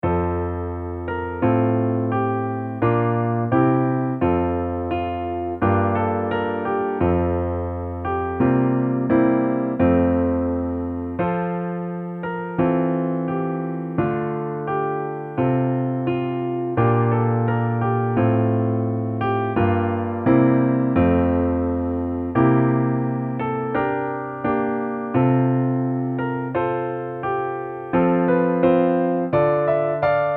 RH Block Chords and Melody

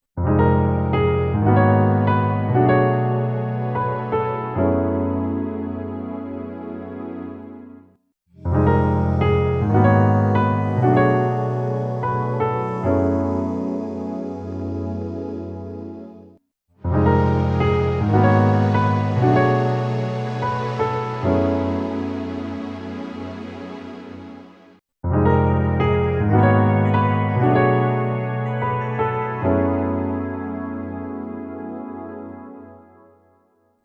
Piano and Pads

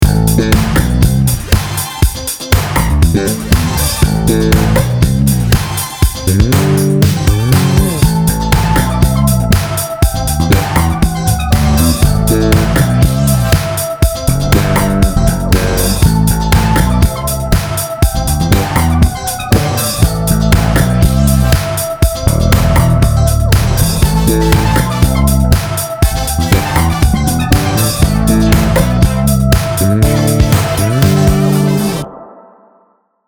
FUNK

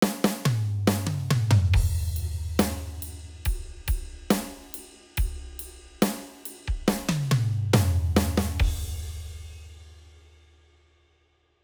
Steel Ring Dry

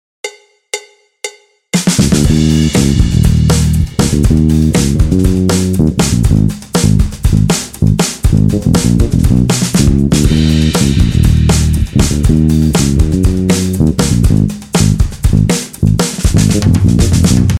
Behind the beat with laid back drums

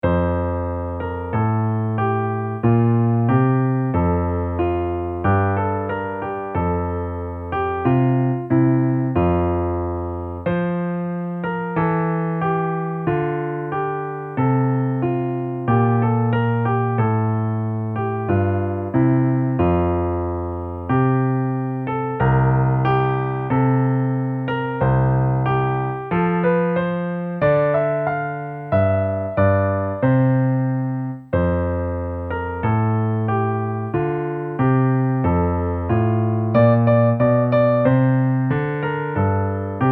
Melody and Bass

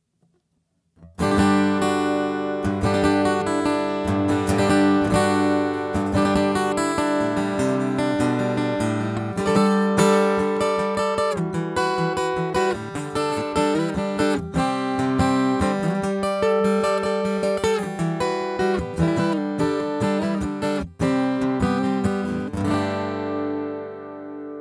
Yamaha FGX5 Test Mic

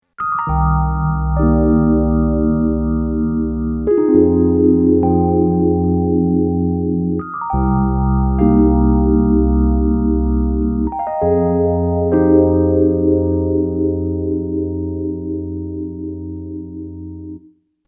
EP Phaser wash

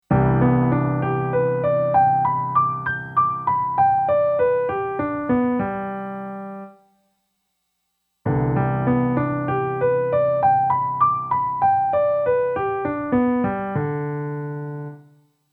G major arpeggio Inversions Sustained Chord

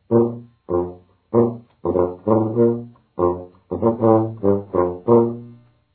Sousaphone

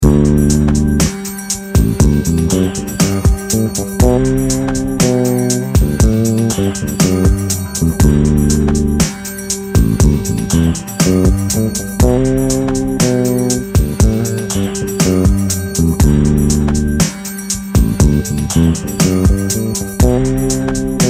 Mixolydian Groove, bass; flatted seventh; a bassist's guide to modes

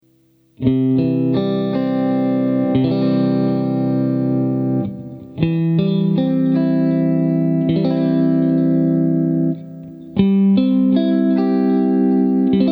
C MAJOR TRIAD INVERSIONS

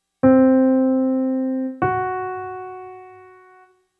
Tritone interval

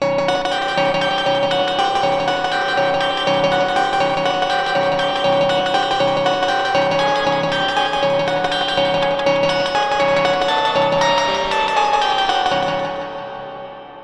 Ring Around the Modulator

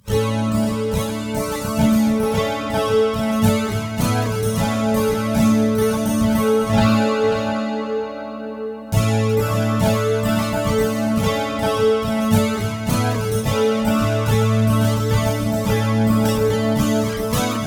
Gtr L R out with Chorus and Delay

